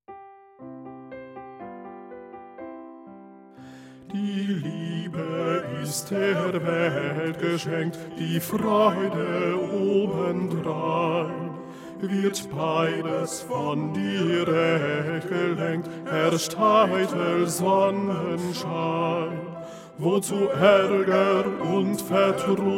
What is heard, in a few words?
TTBB Bass1; maenner